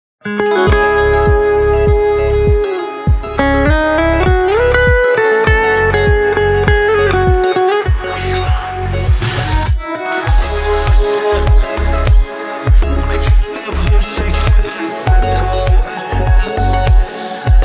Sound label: Karaoke